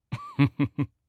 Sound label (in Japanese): フッフッフッフ